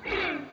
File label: PINKNOISE; Quantum Leap; Guitar & Bass